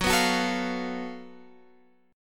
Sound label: Gb Minor Major 7th Double Flat 5th, Guitar Open F; strummed